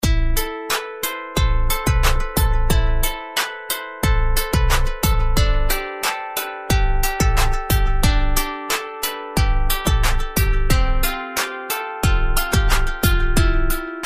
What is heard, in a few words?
love guitar call notification ringtone